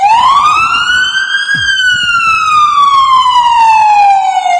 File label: firetruck wail; gmod; vcmod; els